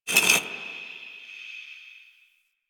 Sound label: Stone Small Scratches; Warframe; Ambience; Entrati